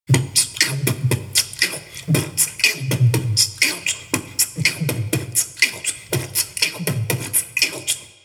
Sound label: beatbox 16 beats